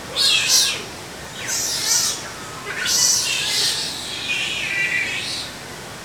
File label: otter